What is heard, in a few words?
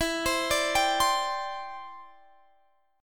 E Minor 7th Sharp 5th